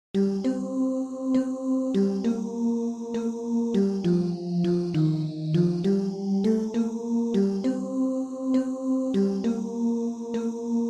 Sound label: away haul away ho Tenors